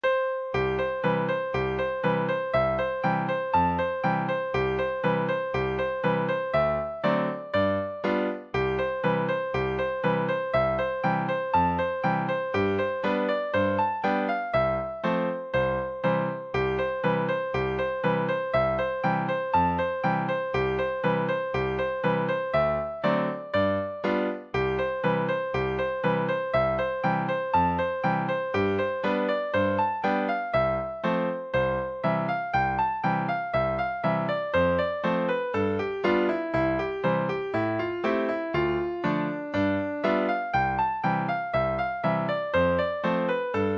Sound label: gipsy hornpipe, scottish guitar